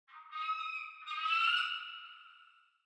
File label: desert birds; ambient; War Thunder